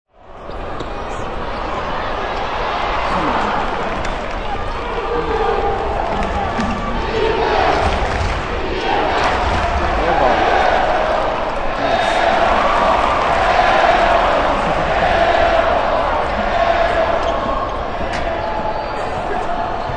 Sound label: Arena Crowd Airball Defense, Crowd in arena yells Airball and Defense; Backgrounds; Arenas